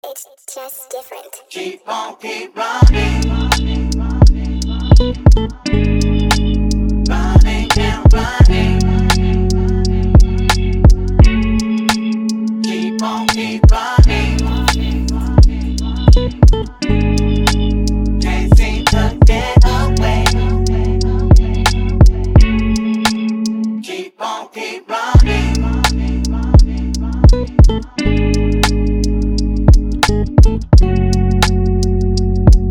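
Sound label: 86BPM A major hook; FOREST GUMP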